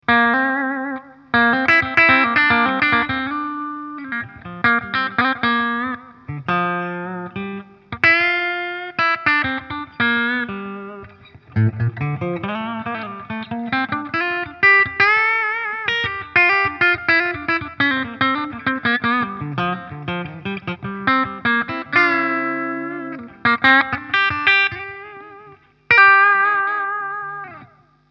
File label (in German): Framus Idolmaker Steg clean